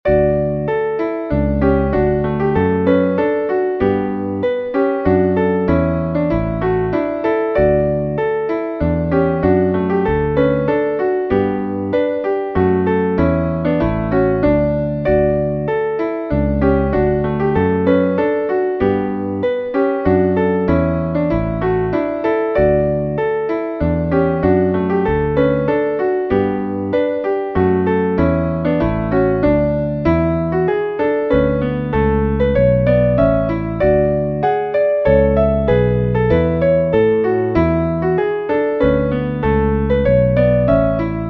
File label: Menuet